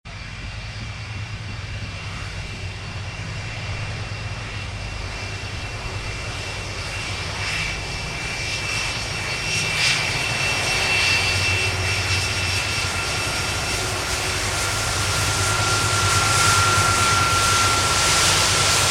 a320 airbus taxi, aircraft

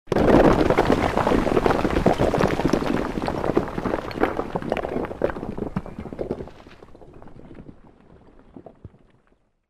created rockslide large stereo

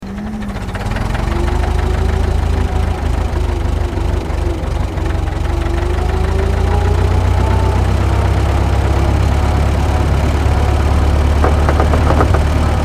chevy

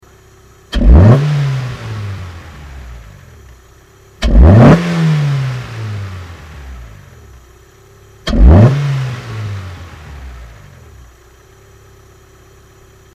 lancer air intake

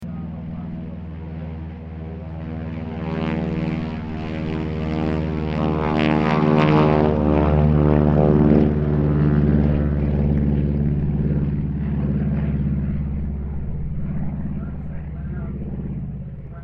aircraft cac ca-25 winjeel takeoff stereo

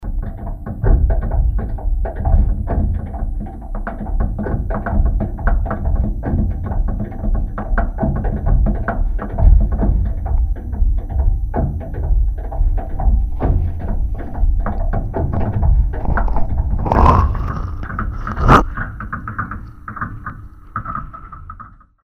tap dancing

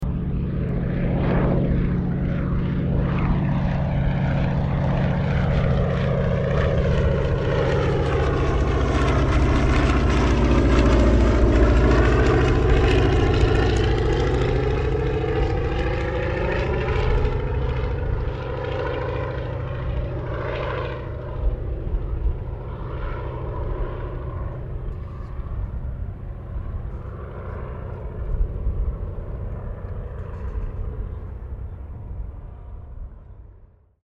aircraft sopwith pup replica pass; 1916

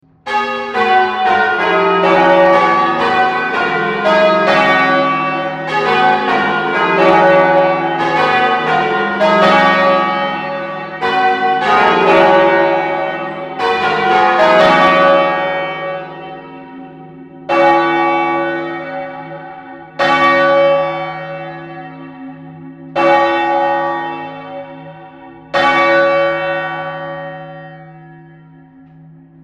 bell st pauls cathedral melbourne bells sequence

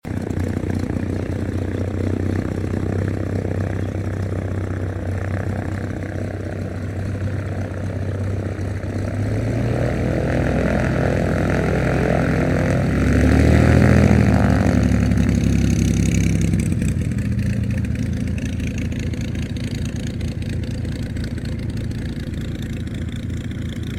aircraft 1965 pl-12 airtruck taxi stereo

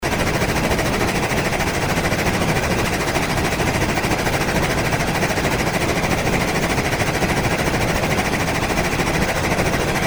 ambient fluctuating electric

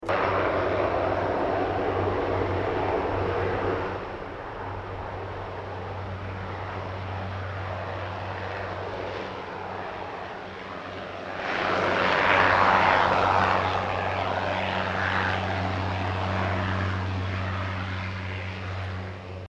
aircraft c130 hecules maneuavering

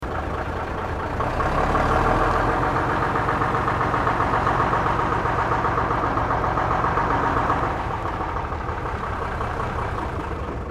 bus

